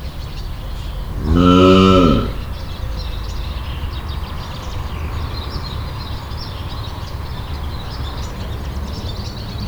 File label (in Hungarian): kicsit magasabb hangon, kardszarvú antilop; debreceni zoo 2012; marantz puska